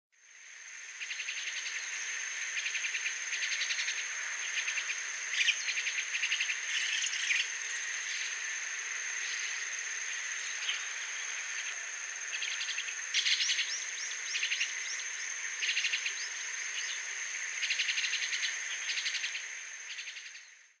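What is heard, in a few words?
White-cheeked Starling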